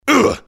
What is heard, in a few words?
mobster pain sharp